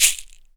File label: SHAKER AFR, Drums; SHAKERS; Big Fish Audio; Ross Garfield Drums VOL-2